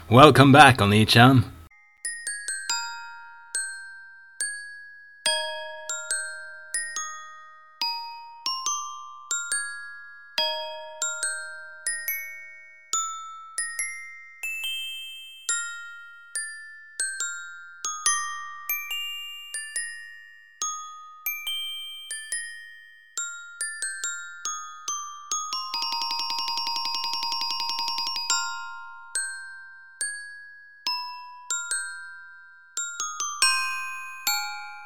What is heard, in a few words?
Sarabande, Musicbox; Sarabande from BWV1010